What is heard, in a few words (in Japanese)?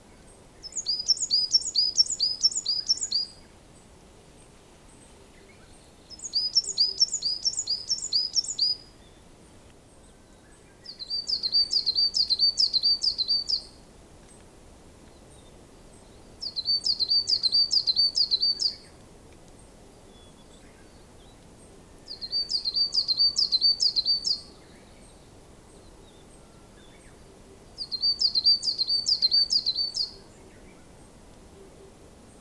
bird